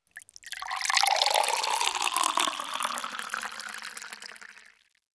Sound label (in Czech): nalevani; Mafie 1